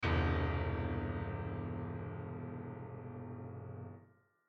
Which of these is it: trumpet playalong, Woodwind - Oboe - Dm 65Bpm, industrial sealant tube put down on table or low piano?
low piano